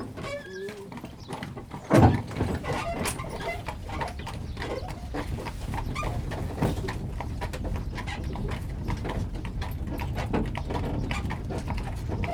szekerezes, lovaskocsi hortobagyi puszta

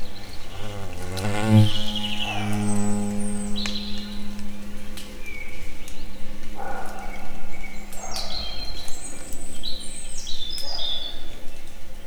félkörívet leíró, rovarok; termeszetben; egerturistahaz

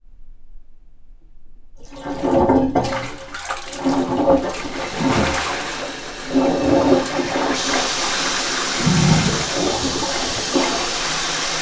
tobbszori meghuzas, wc; angyalfold

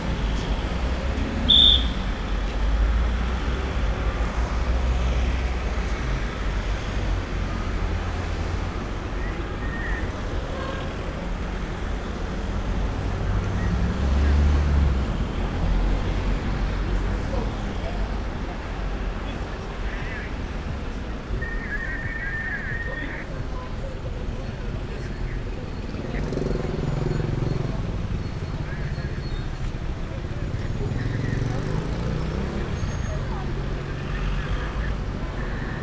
csucsforgalomban sipolo kozlekedesi rendor

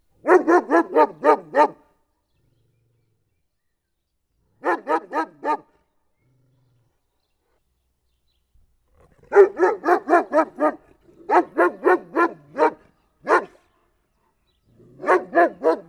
kerítés mentén, kutya; állathangok; paraszt udvar

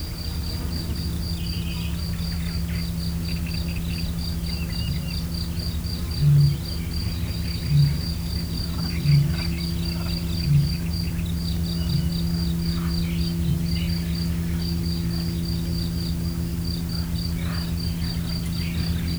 mezei tücsök aláfestés, bölömbika; termeszetben

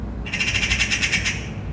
szóló angyalföld, szarka; termeszetben; allathangok

